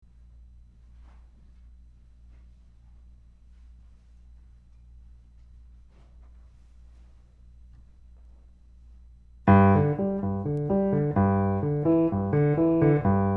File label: Piano, UM25; AMI UM25Proto